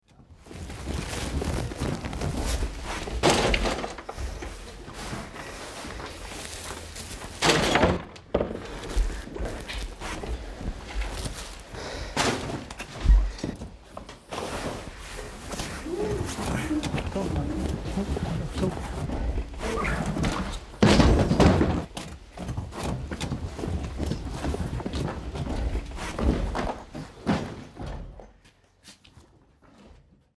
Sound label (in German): scheppern; soundscapes